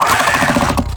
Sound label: Combat Vocal Attack Medium F; Enemies; Warframe; Catbrow